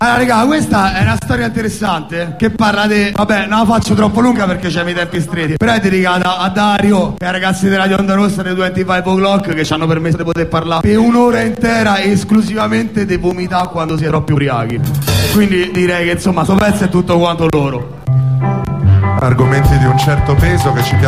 vomitare; dirty jobs; 25o'clock

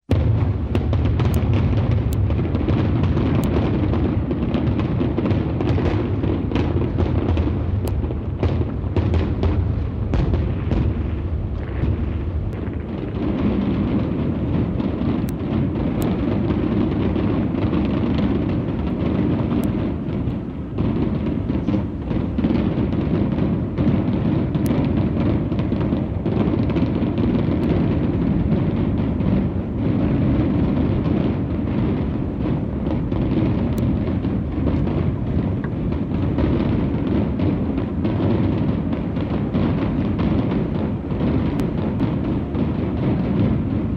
Effetti Sonori - Fuochi D'artificio

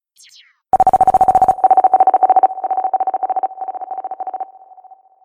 effetto reggae

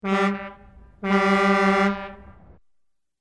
Clacson Camion

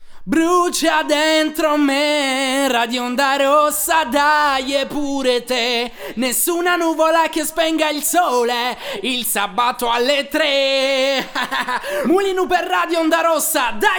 ACCAPPELLA

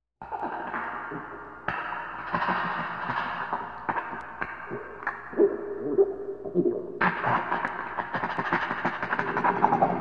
Rumori Mostruosi

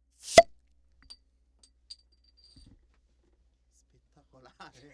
tappo

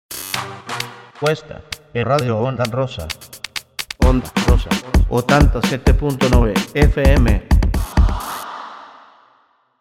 jingle

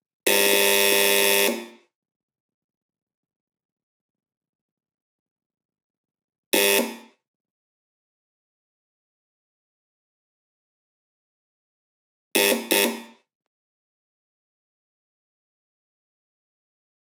Porta

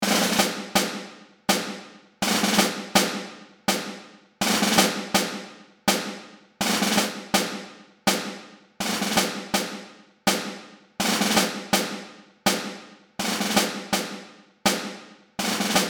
musica

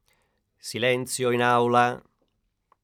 SILENZIO

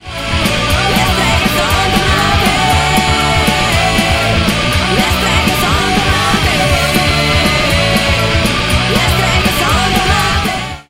jingle streghe

